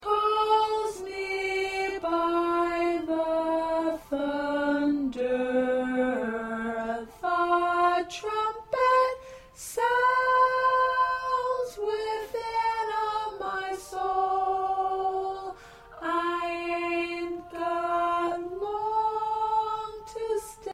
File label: Alto 2